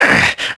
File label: argh; Max Payne; tenements